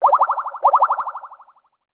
incoming call, Skype WiFi Phone; Netgear; SPH101

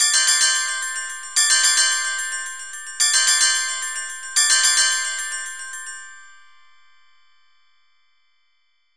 tone; Kyocera; DuraXV-LTE

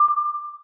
Camcorder Self Timer; Samsung; GT-I7110 Pilot

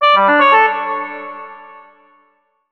Naturals Oboe; Sony Ericsson; PlusFourSix; Venus (Xperia X1); Lars Edvall; Caves

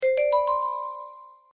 open; Huawei C5800; flip

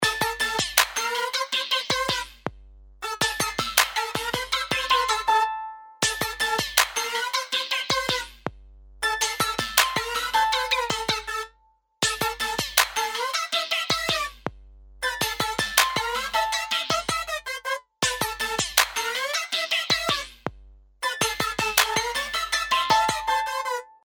DETUNER; HTC; Ring Tone; E-Club Ring Tone Pack 2

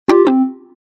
Close, Event sounds; LG KF900 Prada II

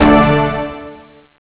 point play done; Bounce Touch

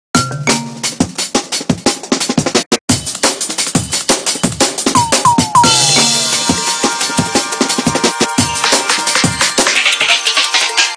Bustle, Ringtones

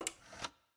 Music Player open; Vivo X80-Pro

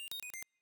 Low Battery

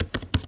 Keyboard Presses, notifications; Oppo Find X5

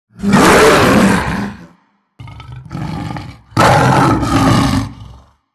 lion roar; PlusFourSix; Thomas Tibert

